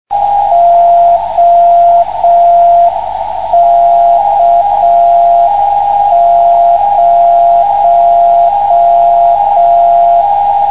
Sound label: beacon